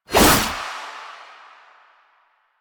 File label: Skana Whoosh; Melee; 1999